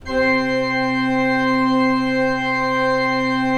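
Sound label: ORG C2, KEYS; Synclavier Sampler Library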